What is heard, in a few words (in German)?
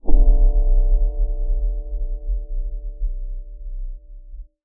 RESONANZ, PEDAL; Xsample VOL-1